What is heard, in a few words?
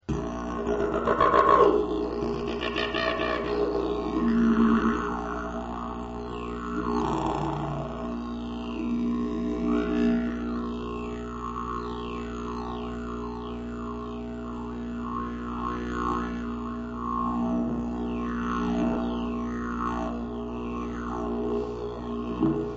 didgeridoo